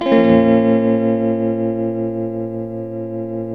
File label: TELTMUMIN B, TELE CHORDS, Guitars; Spectrasonics; Hans Zimmer Guitars VOL-1